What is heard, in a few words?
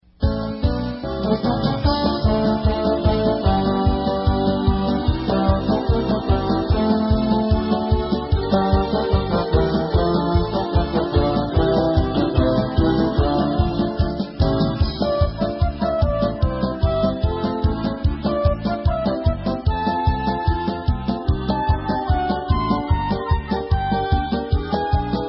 Hora Instrumental